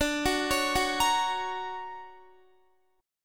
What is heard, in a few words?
D Augmented Major 7th down Guitar